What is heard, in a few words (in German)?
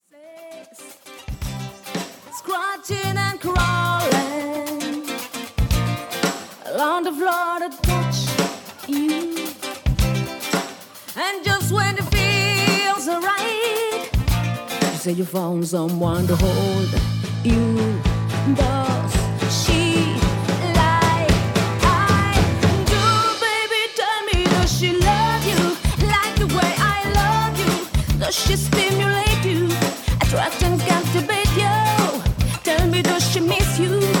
Gesang song